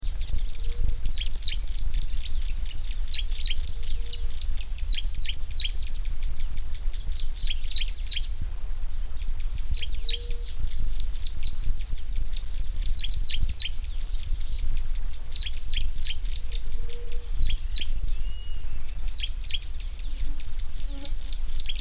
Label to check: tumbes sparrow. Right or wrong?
Right